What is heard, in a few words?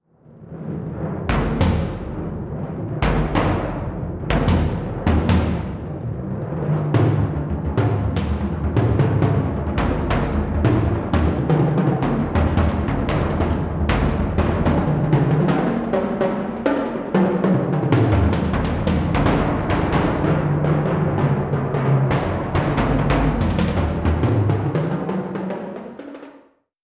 Percussion; Audio Loudspeaker Quality